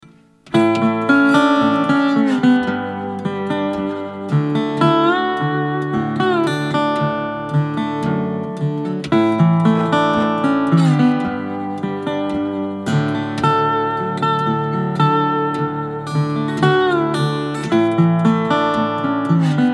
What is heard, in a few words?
Tri Wood Body